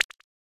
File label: face Turn Player; HeavenStudioPlus; marching Orders